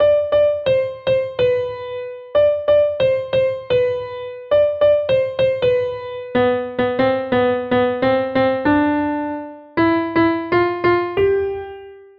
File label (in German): Klavier